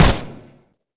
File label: pulse mg fire